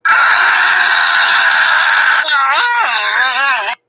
aaaaaaaaaaaah nooooooo

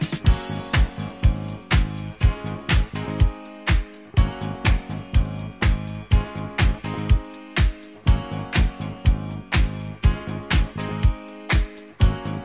music celebrate

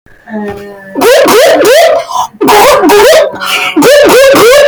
gulp gulp

